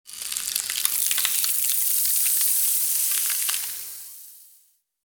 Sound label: Beverage ice freeze commercials ads tv; Film and TV